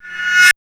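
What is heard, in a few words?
BIG 125 reverse, Big Beat Drumloops; USB Soundscan vol.25; AKAI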